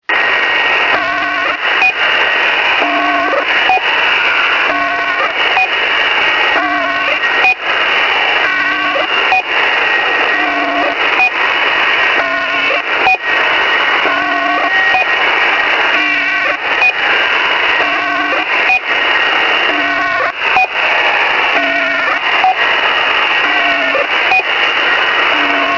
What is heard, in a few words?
channel marker distorted